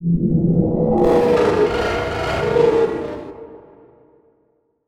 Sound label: Production Element Title Transition Demonic